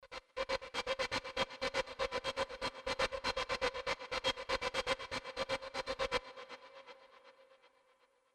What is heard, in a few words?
Envelope Stutter Metallic Edge; Elysion 2